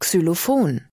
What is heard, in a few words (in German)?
Xylophon